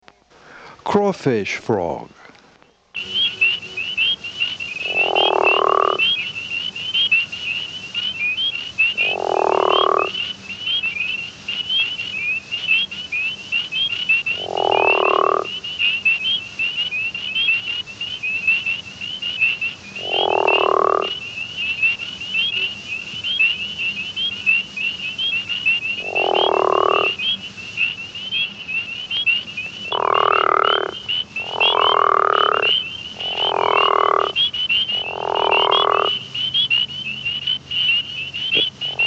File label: Crawfish frog (Rana areolata circulosa); Family Ranidae - True Frogs